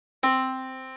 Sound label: yamaha Disk